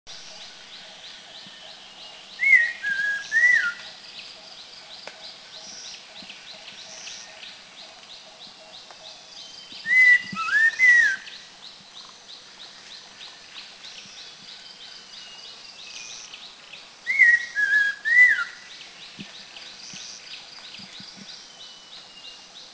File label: Abbots Babbler, Thailand